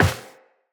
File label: Step Metal, Footsteps, Walk; Tenno